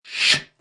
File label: open close